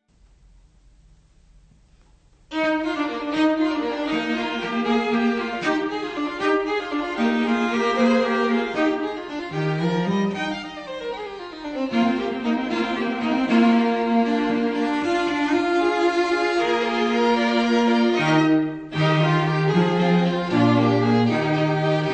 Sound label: quartet